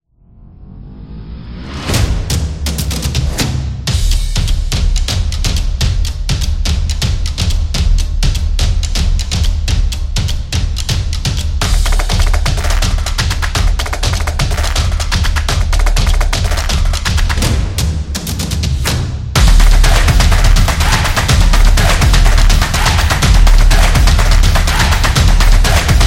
The Drum Beat; samba

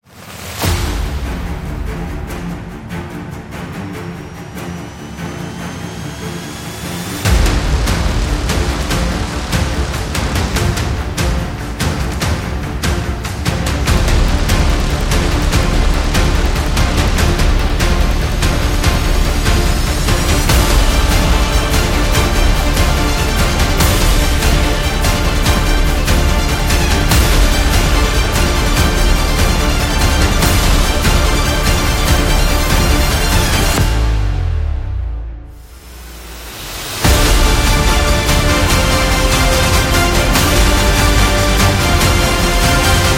The Military

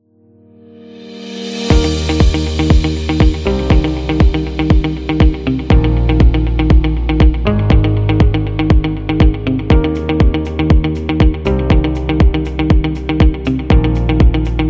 Corporate

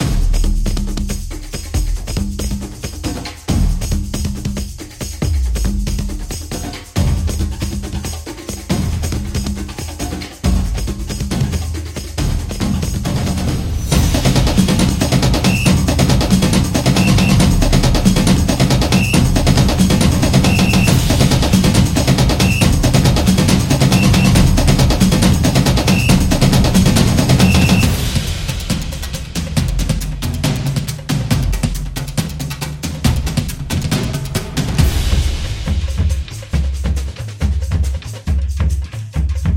Latin Drums

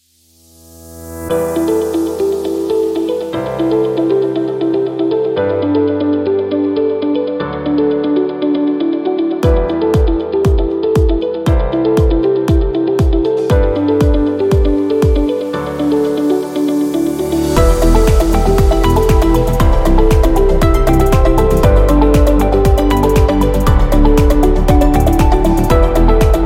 Educational Music

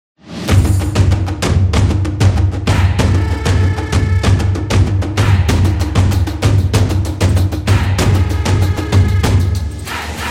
Soccer Sports Stadium Music; sport drums

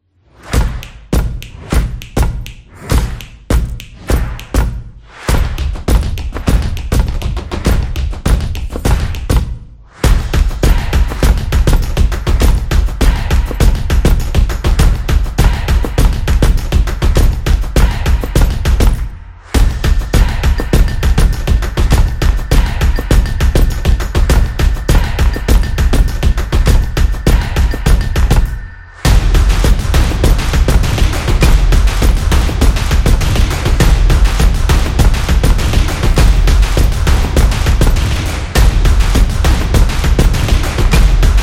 Explainer Upbeat; fast tempo

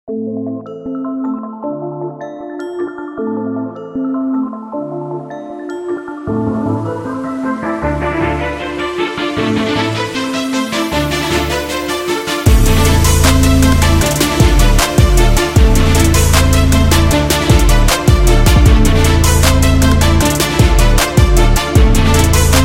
Orchestral Trap

